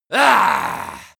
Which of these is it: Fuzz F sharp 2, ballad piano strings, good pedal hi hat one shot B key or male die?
male die